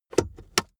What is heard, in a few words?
car accessories center console compartment close; autos; verkehr transport